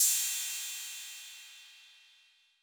Cym B 808 Decay B

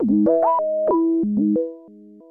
FX Crystal zwarblezzz

